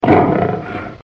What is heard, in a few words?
lion notification